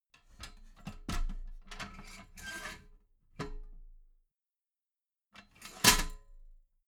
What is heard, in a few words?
metal ladder small handle fold soft